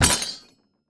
Impact Table Object Hits Table With Metal Objects On It; Impacts